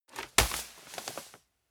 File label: sand bag drop